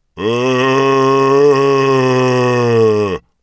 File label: Tauren Warcry; wowpreklad; czwow